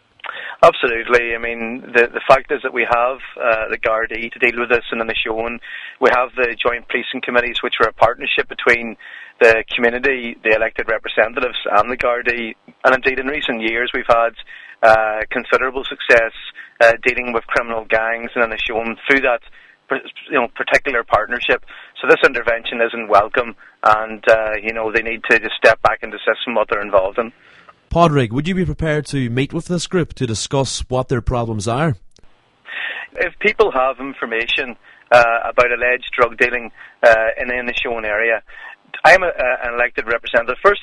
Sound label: pad